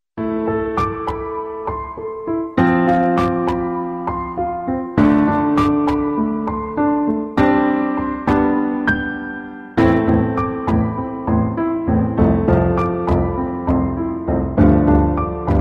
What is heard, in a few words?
Piano